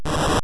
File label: firebreath; Super Mario Land